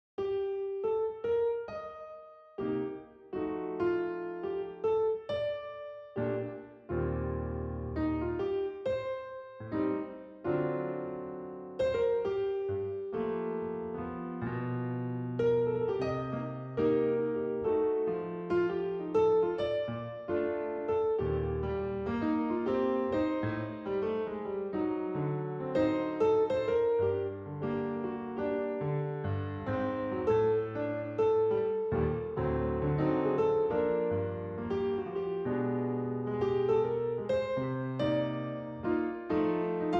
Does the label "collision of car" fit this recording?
No